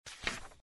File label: card open; baccarat